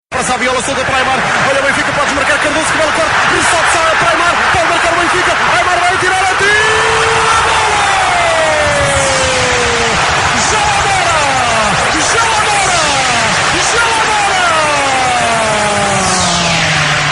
goal; Benfica; Latin

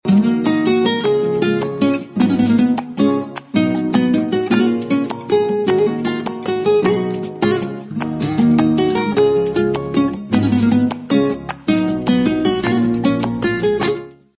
Latin music

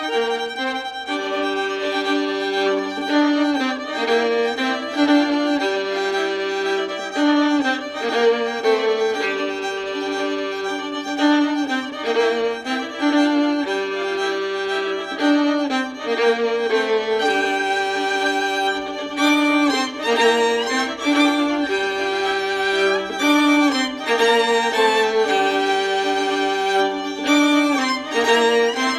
theme violin; The Avengers

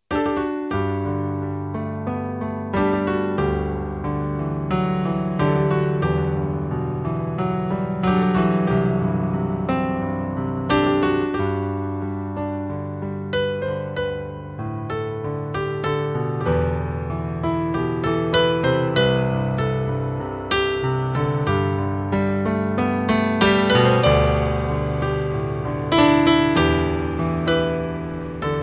piano

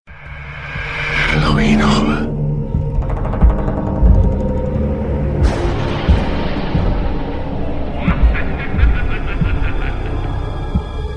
halloween horror, ringtone

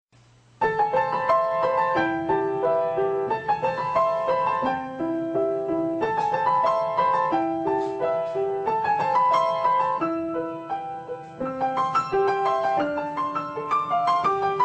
piano lullaby

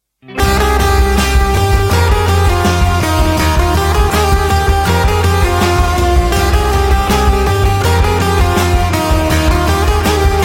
middle east country guitar

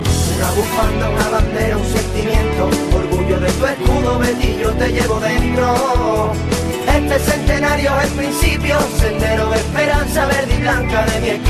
flamenco; fondo; Latin

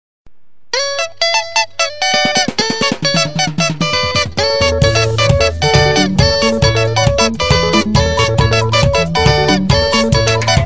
kanindo music; Latin

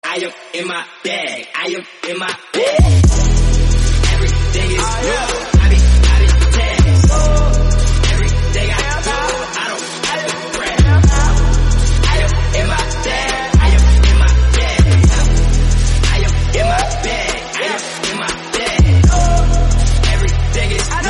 trap

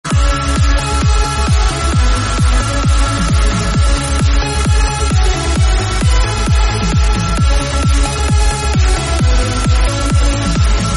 trance; Trance 2016; iPhone

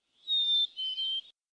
mountain chickadee; Animals

